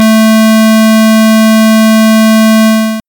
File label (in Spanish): onda cuadrad 220hz